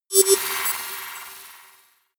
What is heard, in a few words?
computer sound, signale; soundpool